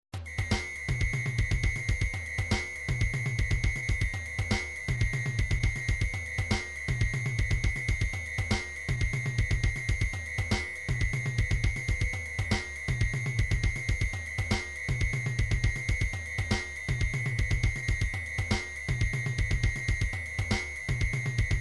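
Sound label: drums pattern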